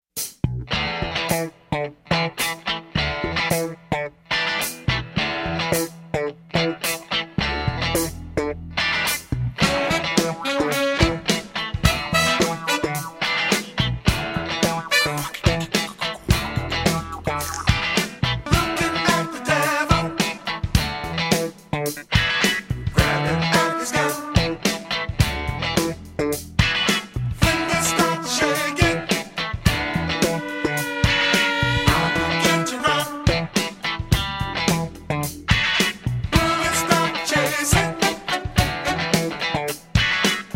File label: Wedding Band